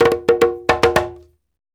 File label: BRKDR, Reggae; Spectrasonics - Supreme Beats - World Dance